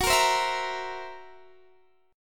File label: Fsharp 7th Flat 5th down Guitar Standard; strummed; JGuitar